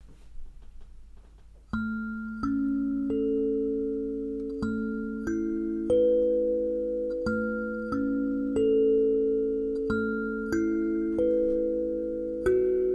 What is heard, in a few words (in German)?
klang einer sansula; Klangbeipiel einer Sansula; Klangzungeninstrumente, die mit den Daumen oder auch Fingern gezupft werden